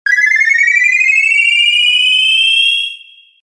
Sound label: thirothirothiro